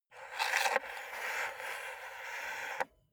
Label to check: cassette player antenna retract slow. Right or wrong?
Right